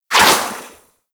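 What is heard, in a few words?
Primary crossbow Tail; Warframe; Tenno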